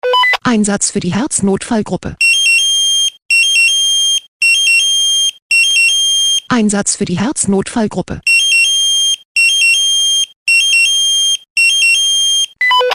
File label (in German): SMS Alarmierungstone Herznotfallgruppe